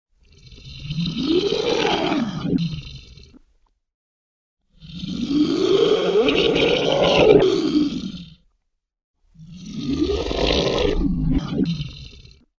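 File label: monster sound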